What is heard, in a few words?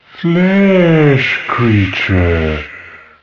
skeleton alert; monsters